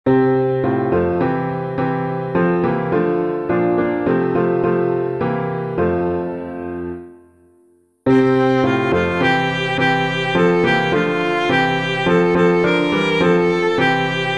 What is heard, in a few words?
piano